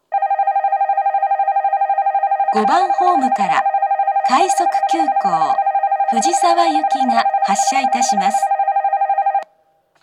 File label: shinjuku rapid express fujisawa; station